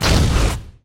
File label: attack effect